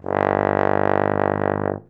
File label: BARITON; Best Service; Alpine Volksmusik